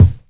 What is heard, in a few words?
boomy bass drum sample f key; kick drum samples